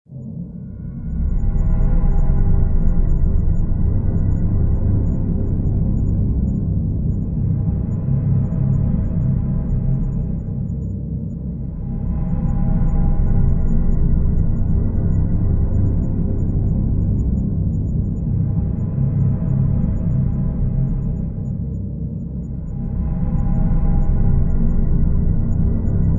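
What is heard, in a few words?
Drone Dead Zone; Brand X Music; Brand X Music Catalogue - Editor's Essentials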